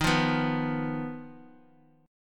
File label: D sharp Suspended 2nd Sharp 5th D sharp